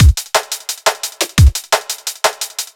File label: Drum Loops, 174; M8; Electronisounds